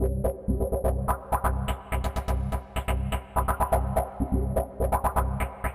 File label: Americana Elec Beat No Pan